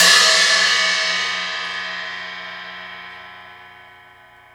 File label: Crash Ball, Cymbal; KJ Sawka; Drum n Bass & Beyond; Drum Tool Box